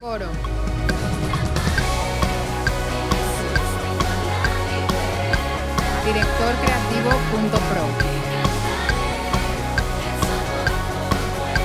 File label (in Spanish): Proclamare, Gb, 135, 4/4